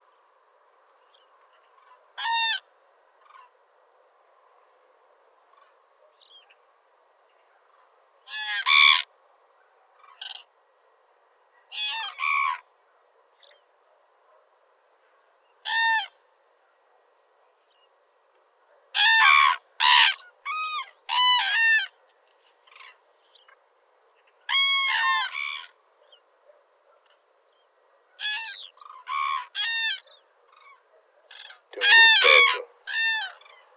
call, Grus grus, Crane, Note the many different call types uttered by the birds. Background: anthus sp.